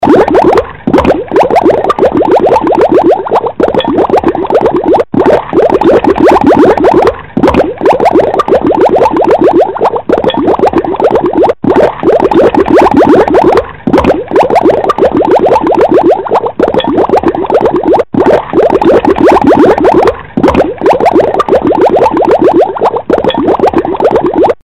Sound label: Melody, RingTune